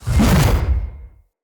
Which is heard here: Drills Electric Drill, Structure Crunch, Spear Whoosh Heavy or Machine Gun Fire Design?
Spear Whoosh Heavy